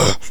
pain; player

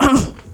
fall; arachna

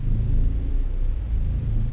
ambience

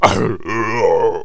death; player

